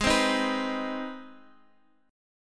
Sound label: C Minor Major 7th Sharp 5th G sharp